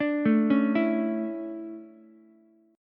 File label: D Major 7th Suspended 2nd, Ukulele; DM7sus2; arpeggiated; JGuitar